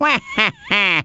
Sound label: laugh; waluigi